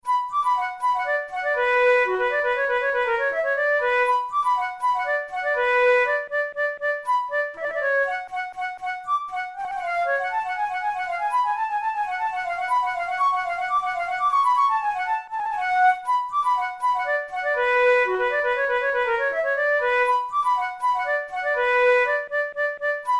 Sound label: badinerie, flute